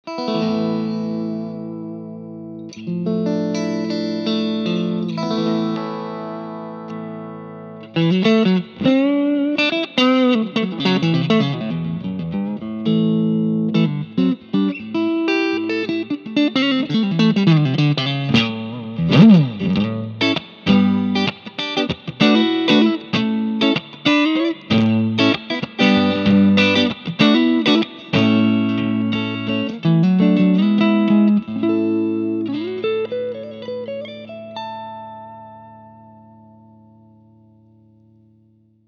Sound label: clean Strat